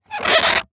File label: Cartoon Balloon Rub By Hands Squeal; AdobeCC